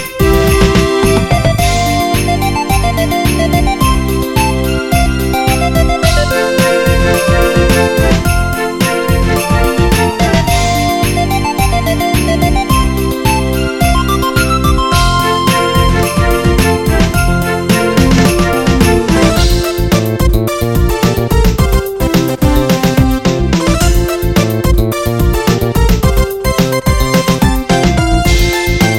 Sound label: Ring Rink